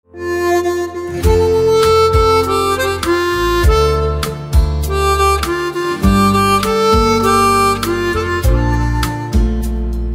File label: Harmonica